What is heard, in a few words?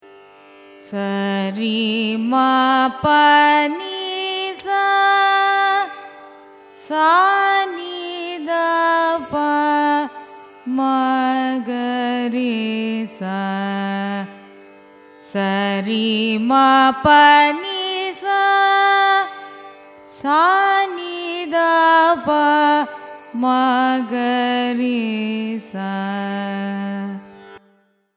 raga desh arohanam avarohanam; carnatic music